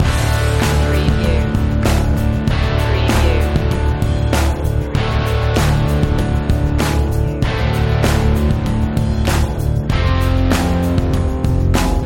texas rocker loop

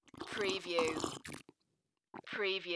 Drink Sip Swallow; Sound Effects Bible

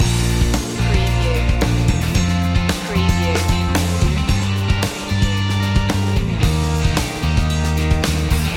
city escape loop no piano

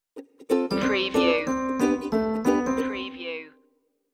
reggae happy stinger

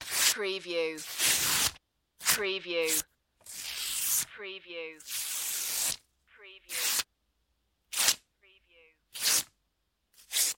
rip paper

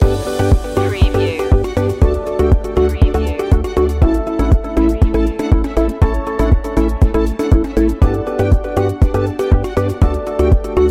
communication beacon loop

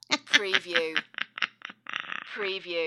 Witch Cackle

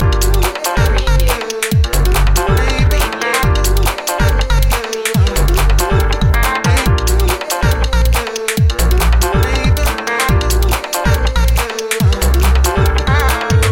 keen tweaks loop

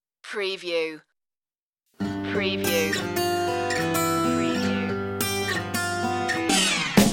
beats loop intro

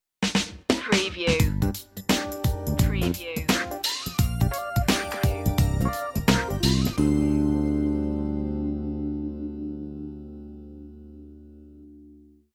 90s hip hop sitcom sting

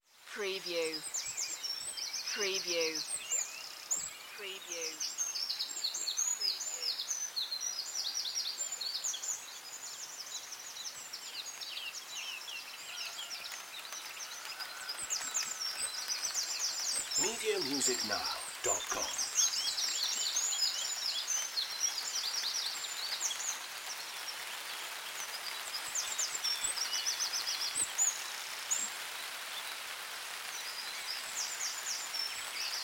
Dawn chorus with rain in Anglesey Wales; beanstalk audio

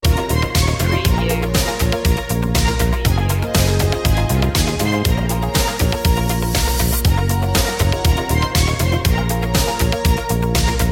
electronic; media music now

